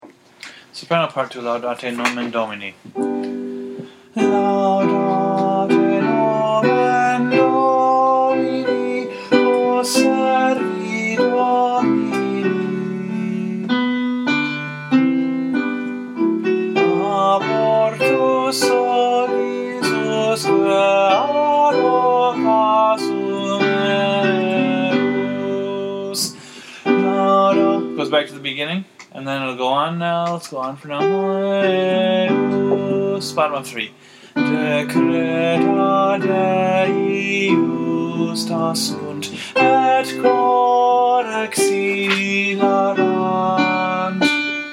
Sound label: soprano; laudate nomen domini